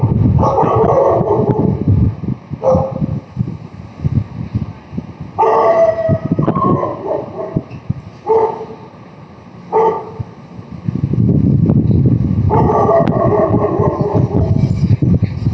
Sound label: bark samples